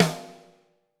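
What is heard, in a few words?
snare drum, Drums; Bob Clearmountain Drums I; SD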